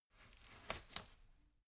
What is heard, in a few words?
folding chair fold slow